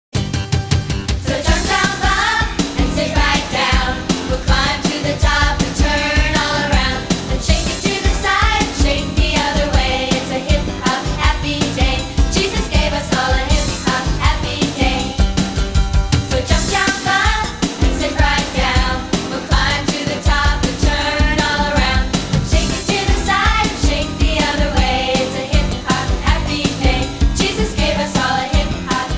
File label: hip hop happy day